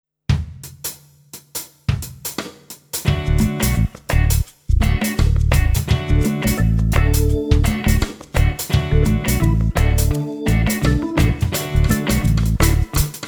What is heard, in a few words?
Reggae Double